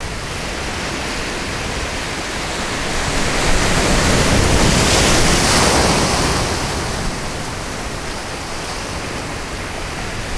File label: Waves; Pet Rock; ambience pet rock